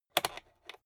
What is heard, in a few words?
rotary control short turn creaky